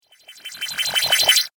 Cartoon Magic Spell

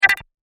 Tremolo Pulse Scanner, laser; Laser Sound; science fiction sound effect; Andrew Fleming

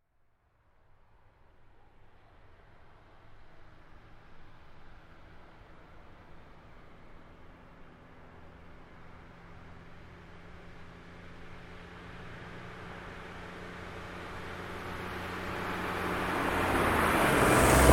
Car Smart Car By Med; 2005 Smartcar Fortwo; Vehicles; Big Room Sound

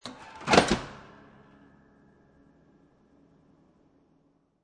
Oven Door Close, Household, Oven, Door Close; Kitchen; Kitchen Sound Effects

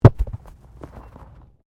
Body movement Tumble Medium; Body movement foley to simulate falls and deaths; Rolling

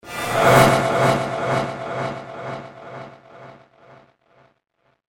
Epic Swipe With Echo, whoosh; Whoosh Sound Effects

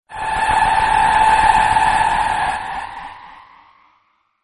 space screech; Spacecrafts

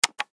Button Switch

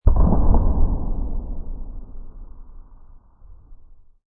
Amb Hit

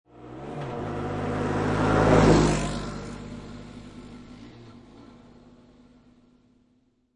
Quad Bike Drive By Center To Right; Quad Bike. Motorbike, motorcycle type Quadbike. Drive by, Center to Right.; Lynne Music

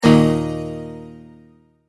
Multimedia Tone-Stop

